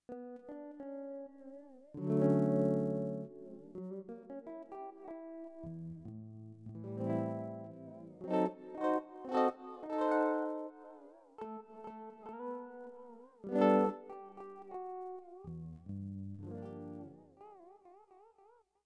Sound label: flanger